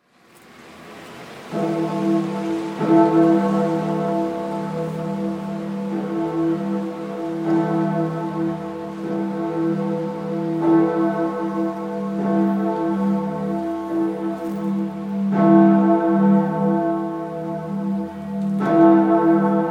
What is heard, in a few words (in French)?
cathédrale cloche MI2; BERNE; cloche 1